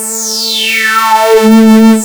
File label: OB 18 A4, Rare Synthesizer; Hollywood Edge - Giorgio Moroder Rare Synthesizer Collection; OB 4 VOX.